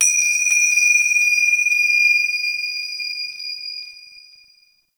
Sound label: Temple High Bells; Ambience; Corpus Ship; Temple One